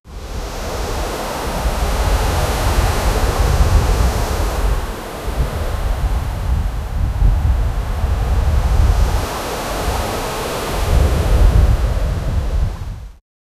the sound of the sea

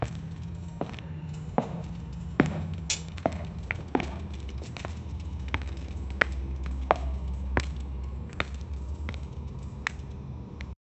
the cyborg walks on concr

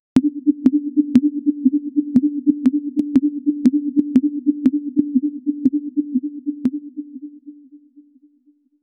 mystic pulsing portal hum; mystical

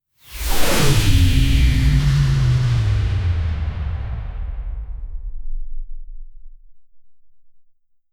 a powerful sci-fi energy, fireball

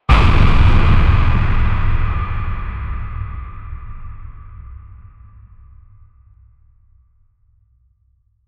cinematic sfx with deep, trailer

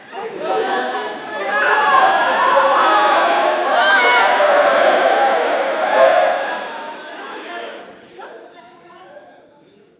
crowd at an indoor pool

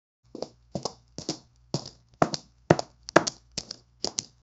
the sound of womens shoes, fashion

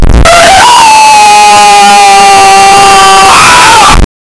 low growl

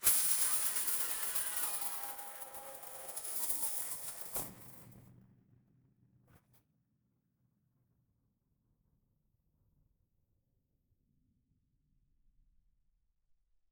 futuristic sci fi metal crash

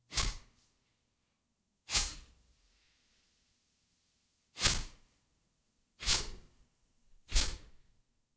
sound of a portafilter bei, coffee

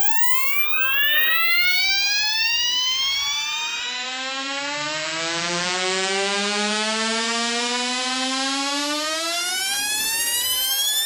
cool digital expansion

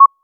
a short crisp user interf, menu

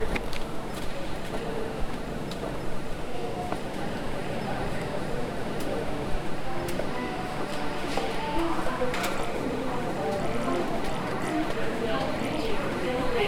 walking in a cafe

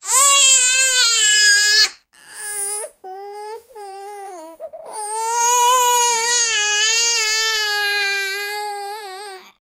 baby crying long duration; infant

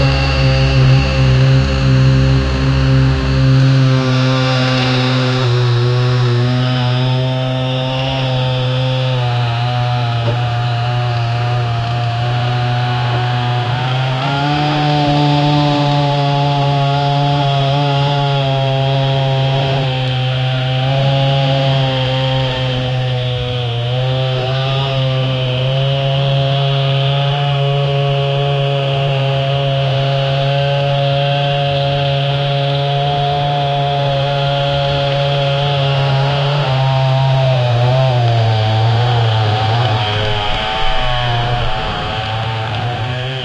deep forest ambience slow; wood cracking

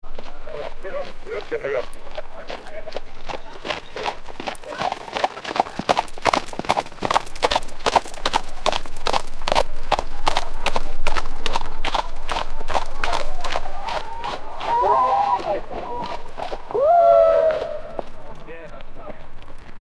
horse racing on a wet

